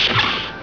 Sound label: DOOR